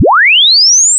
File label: sine 20 to 8k